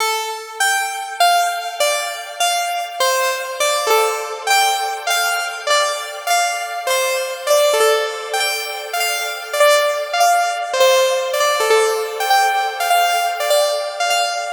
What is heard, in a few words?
phasing phrase